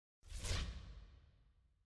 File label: finals lose; clash brackets